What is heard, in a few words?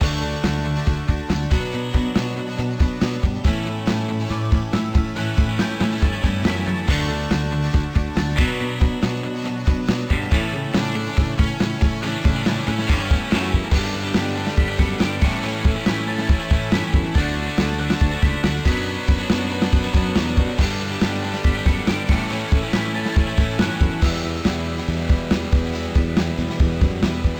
Concert; nds